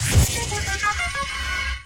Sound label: Bingle